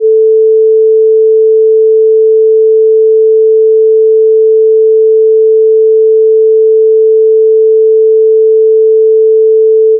Sine A4

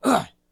Male Hurt

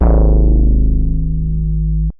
909 Kick

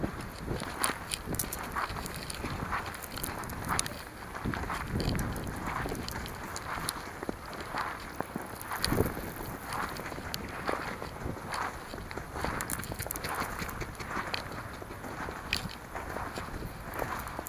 Footsteps In Snow

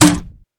Grenade Fire

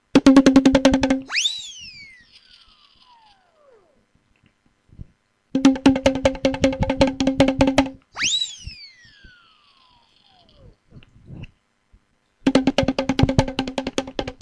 Bongo Feet And Zip

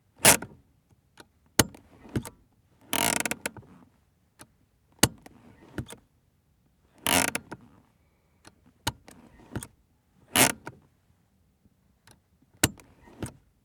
Rover 216 Gsi Handbrake Moving Up And Down

